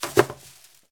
Plastic Container Drop Trash; SFX Kit; Foley - Misc

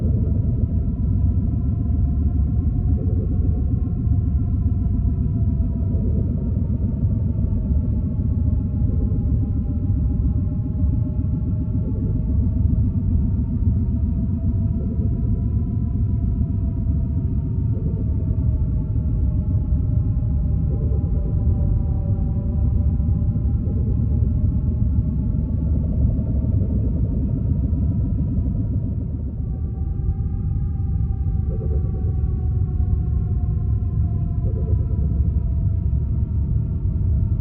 Spaceship rumble; Inquisitor 2

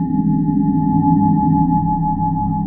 Tractor Beam Loop; SFX Kit; Sci-Fi Loops

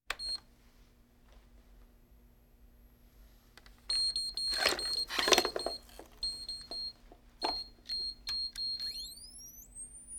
Hospital effects

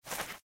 Snow Heap; Blind Quest - The Frost Demon

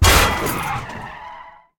hit motorbike; Blind Drive

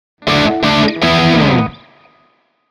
Rock Guitar - Final Chords; Hollywood Edge; sound designer's toolkit v2

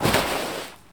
Baseball Slide Grass

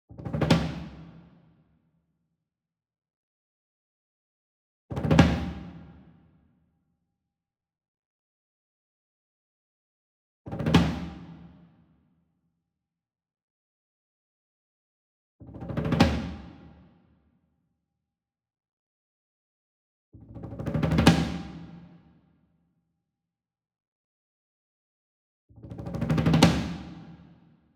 SINGLE DRUM Tom Concert Roll Soft Beater Medium; boom library; Cinematic Strikes Construction Kit

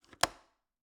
Cabin, window, latch, flip, lock, open; Eiravaein Works; Vaeyan IV

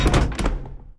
dungeon exit closed; DeadFright

